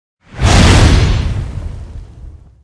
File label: attack fire; effect; wulingzhu